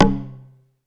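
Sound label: PERC 3 C2, percussion; SEQ AND PERC; Music Store - Claudius Diemeter Schwerlos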